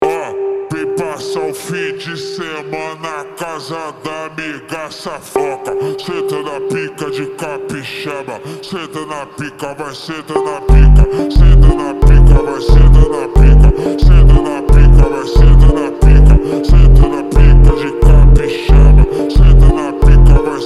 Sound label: Phonk MONTAGEM Ultra Slowed